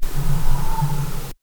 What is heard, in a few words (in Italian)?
Respiro; VillaMussolini